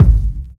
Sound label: subtle reverb kick single shot G key